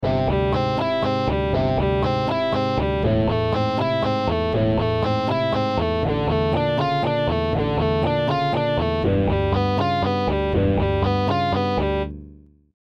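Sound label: Arpège 1 Bass; Scales and Arpeggios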